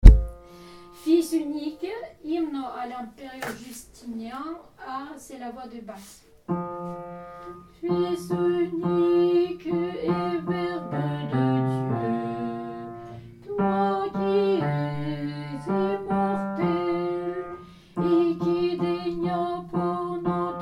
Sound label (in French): basse